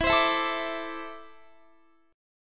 E 7th Suspended 4th Sharp 5th E down Guitar 4E 4A 5D 5G 5C 6F; strummed